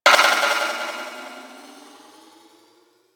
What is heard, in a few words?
distant metal ronks; Warframe; Corpus Railjack; Ambience